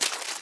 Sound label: Footsteps Ice; Lara; Playable Tutorial Level